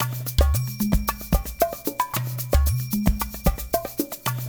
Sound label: LOOP 1 L, Percussion; InVision Interactive; GRUNT